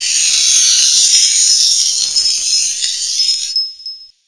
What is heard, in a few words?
die; boitata